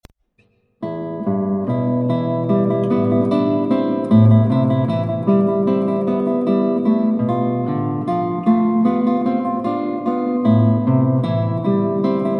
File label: original work for classical guitar; peace for peace